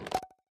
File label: ui popup close; Teen Titans Go! - Raven's Rainbow Dreams